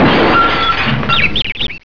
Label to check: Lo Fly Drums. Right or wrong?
Wrong